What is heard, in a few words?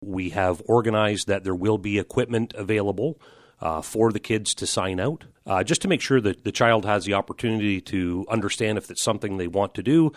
bagpipes